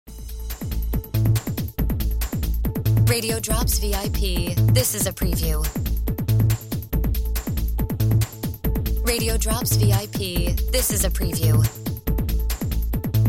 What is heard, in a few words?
No FX Music Bed